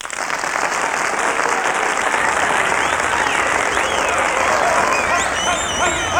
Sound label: clapping crowd